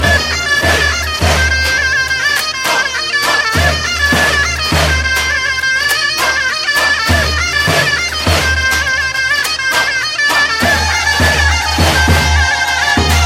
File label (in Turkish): halay